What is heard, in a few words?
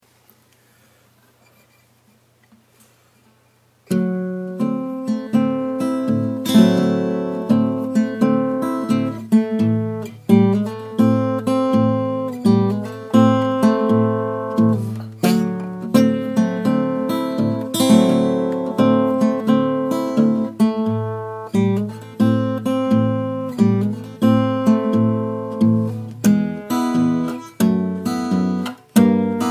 deep blues